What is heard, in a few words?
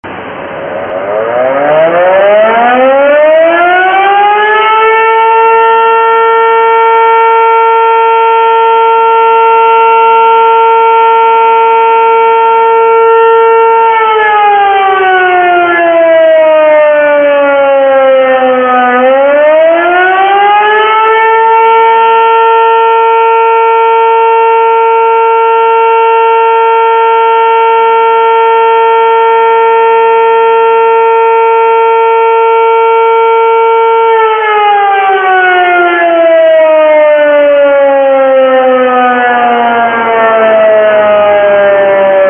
model7 low, air raid sirens